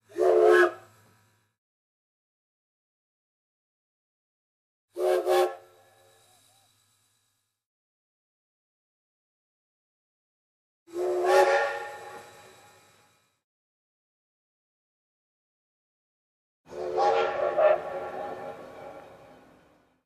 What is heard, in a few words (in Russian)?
poezda